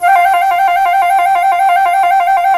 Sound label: C flute trill